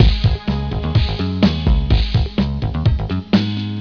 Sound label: BEAT